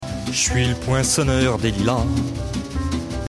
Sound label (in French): Quinte Montante